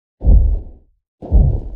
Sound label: Sandscrit Walk loop